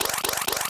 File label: Ammo Replenish